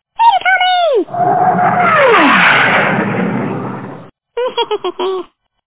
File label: incoming